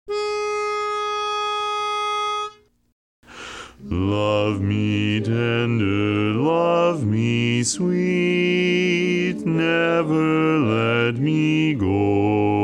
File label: Bass Only, chorus; Enrichment Track